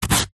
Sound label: foley foam dense single scratch sharp object